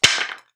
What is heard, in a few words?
wood breaking; wood metal SFX